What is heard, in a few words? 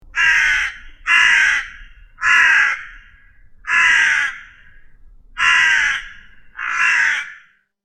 birds